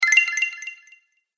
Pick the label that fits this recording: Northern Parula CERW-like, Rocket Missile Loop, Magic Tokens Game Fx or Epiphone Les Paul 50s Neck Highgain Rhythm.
Magic Tokens Game Fx